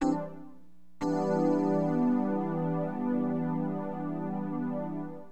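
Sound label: JV rhodes, keyboards; HipHop Keyboards; LiveAct Pool 1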